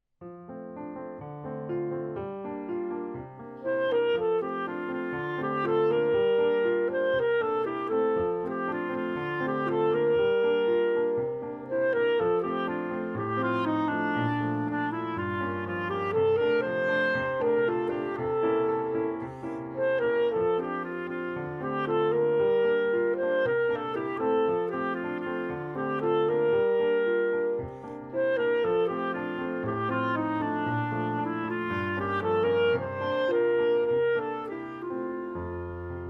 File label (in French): playbacks; barouf; bon geste